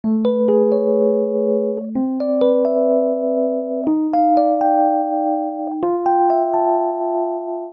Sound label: Progression Rising Major Uptempo I - bIII - bV - bVI A Major 124 bpm, Electric Keyboards; Ableton; Factory Packs